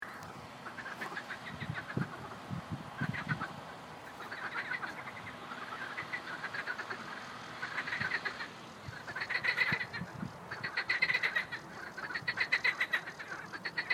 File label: Marsh frogs